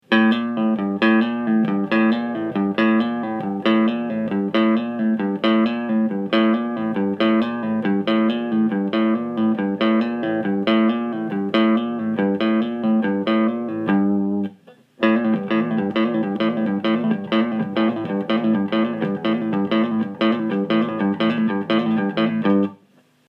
fast blues lick

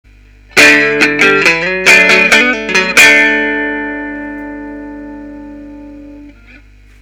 Guitar Lick

